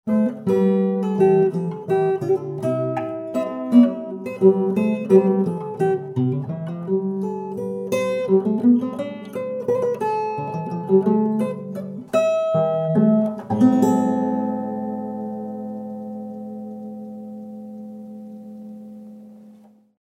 travel guit

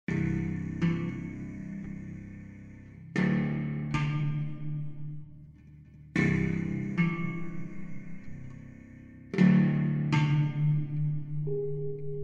Coils

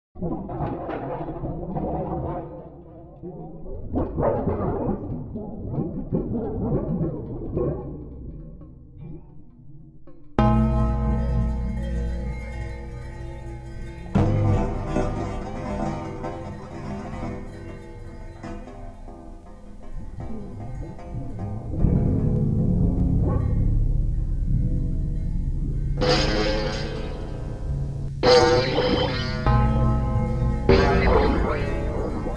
Flopping Over Bands

